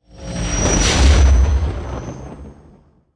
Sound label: Faucon, vaisseaux